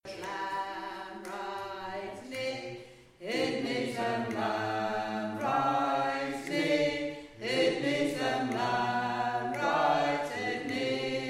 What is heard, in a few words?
Bass